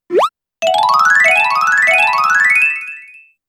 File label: Heal Bell; pokemon sfx gen 7 attack moves sumo usum